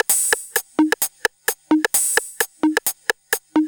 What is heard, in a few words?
Drums Techno